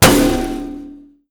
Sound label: Panel Shower Hit; Among Us